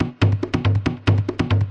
140 bpm, bongo lowdown, drum loops and breaks

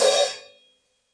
crash deep choke, DRUMS (SINGLE HITS), Crashes

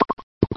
Tennis

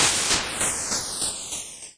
8bit kit Hit, 8 Bit Videogame Kit; LO FI and 8 BIT KITS

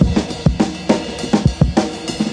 snare, 102 bpm, drum loop

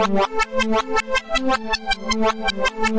160 backwards synthy melody

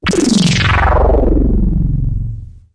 wet spinner; Weird and Interesting Hits; single hits

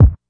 classic hip hop kick; Classic Hip Hop Mega Kit

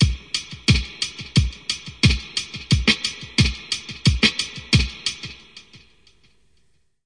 slowecho, 089 bpm, drum loop

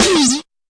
fx drum

